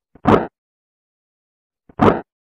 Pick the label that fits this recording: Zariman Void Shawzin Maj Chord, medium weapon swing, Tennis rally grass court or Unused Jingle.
medium weapon swing